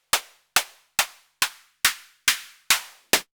HIHAT TECHNO 140, Techno Drum; Techno2

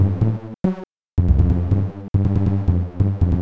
SNTH BASS ELCTR 140 A, Techno

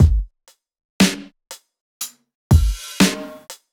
The Funk, Loops

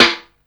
ragga snare drum; SNAREDRUMS

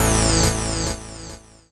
KEY SHOT TEKNO 140 A; Techno2; Singleshots

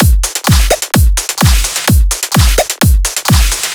drum loop 128 Full; Electro; drum loops; Anthemic

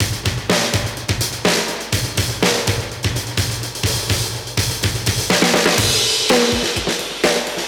125 Classic Breaks, 125

